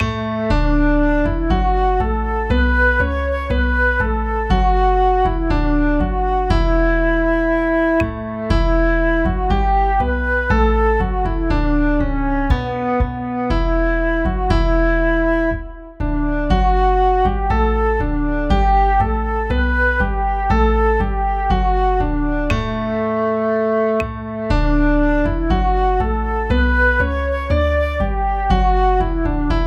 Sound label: National Anthem